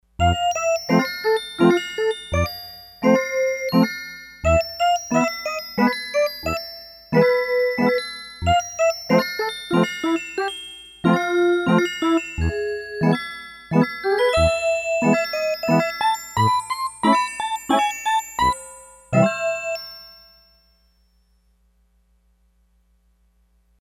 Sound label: Echo Bell, Roland D-10; Factory Patches